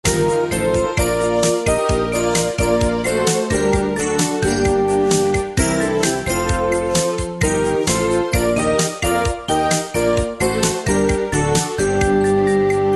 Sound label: god rest ye merry gentlemen